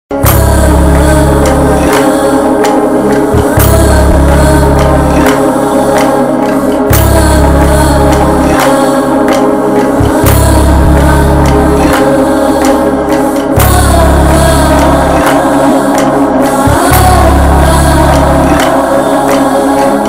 sad bgm; veera simha reddy; balakrisha; varalakshmi

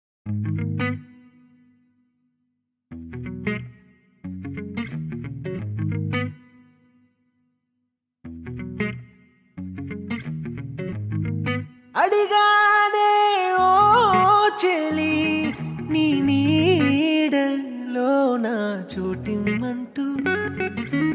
Telugu Song

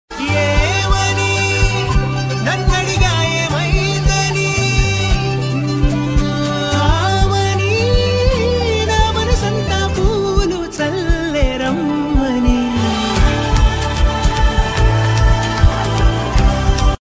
love bgm Telugu ringtone; ante sundaraniki; nani

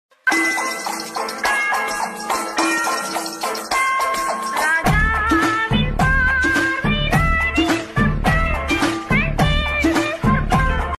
remix ringtone viral song bgm tamil

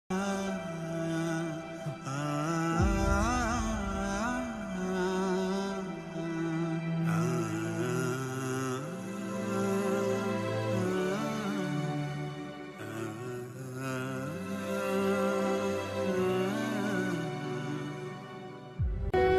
sad ending bgm ringtone; mahasamudram

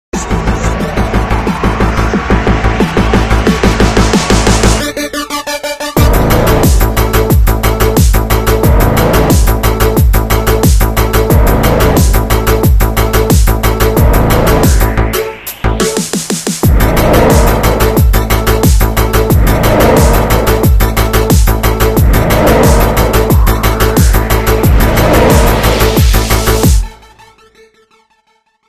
title bgm; Abraham Ozler; Jayaram; Midhun Manuel Thomas

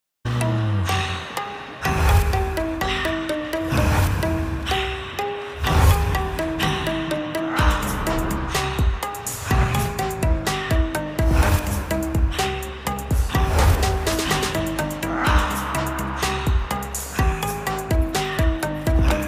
horror bgm ringtone from south movie; zombie reddy

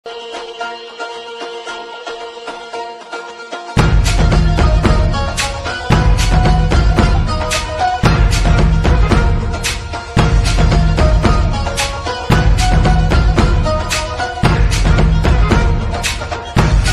dj remix ringtone; kurulus osman

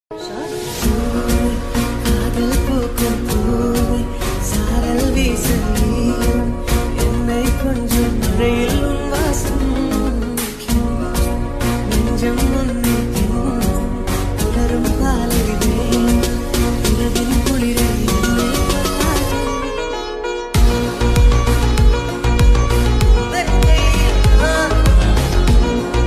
tamil love bgm ringtone; bachelor

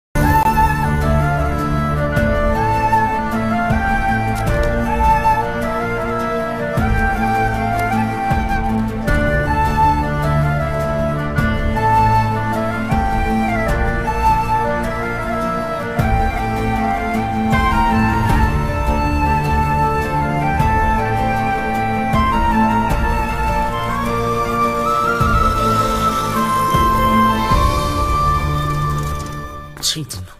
love bgm ringtone; prema vimanam

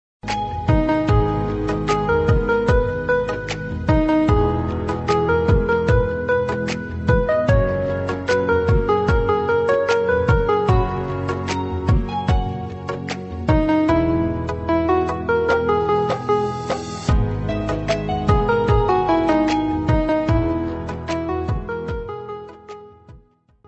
mobile ringtone in flute ringtone party ringtone

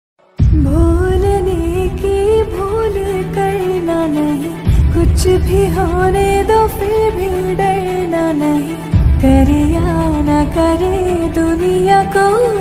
last emotional song by mother female version; kgf 2; rockys dying moment

